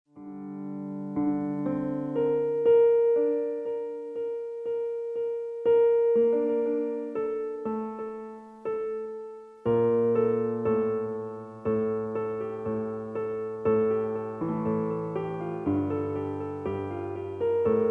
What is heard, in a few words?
Piano